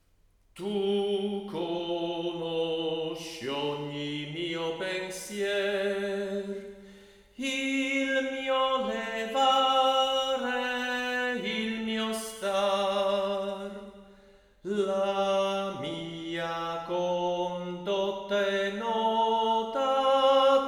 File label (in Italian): Salmo cantato con riverbero; il canto dei salmi biblici durante il culto cristiano; salmi 16 30